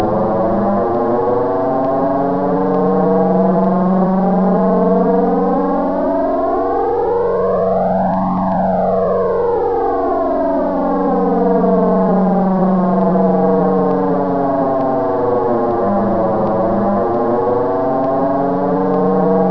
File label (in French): Bruit de deuch